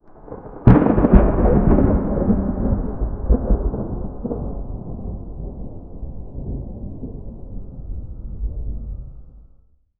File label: environmental, sound-effects